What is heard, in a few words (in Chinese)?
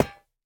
下界合金破坏声